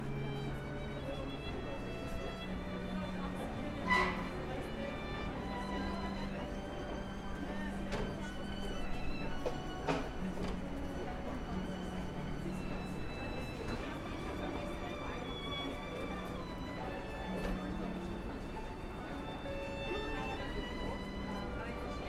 Vienna Violin, street musicians; Violinist on the Street

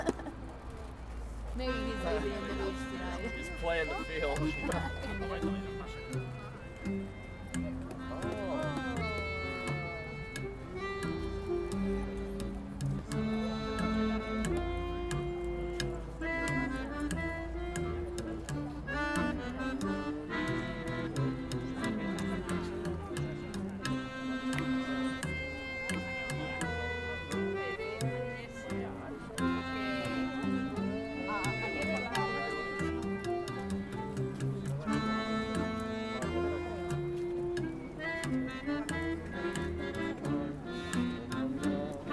San Sebastian Jazz; sirens

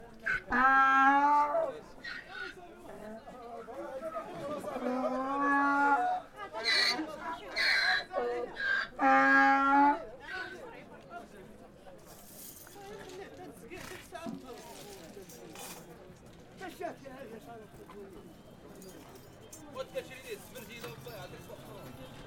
Marrakech Donkey

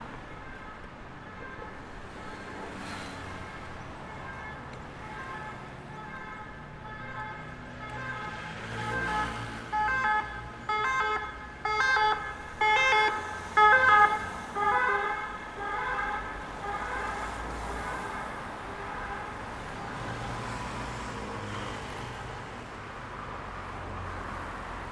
Barcelona Siren; Sirens and Crosswalk Signals

